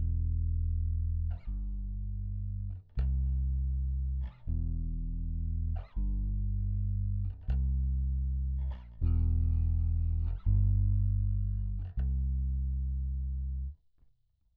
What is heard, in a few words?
bass